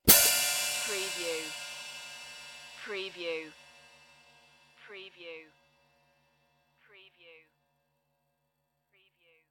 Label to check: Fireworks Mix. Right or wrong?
Wrong